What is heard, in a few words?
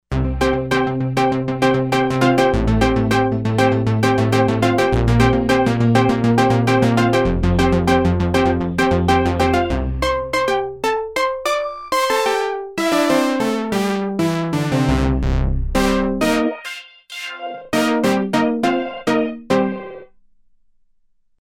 Lute Tronica